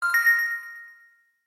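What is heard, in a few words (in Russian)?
звонкий короткий звук уведомления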